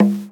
percussion one shot conga low; kong; Sample Magic; Classic Chicago House; Drum Hits

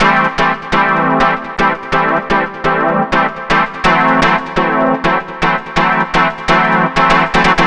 SYN 125, House synth loops

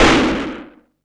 Snare, Drum & Bass

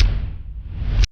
REV KICK

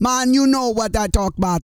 REGGAE, Vocal Planet World Voices

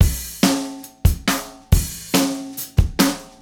Acoustic Kit, Drums & Percussion

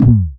KICK PRO -L, Disco House; USB Soundscan vol.20 - Fresh Disco House I

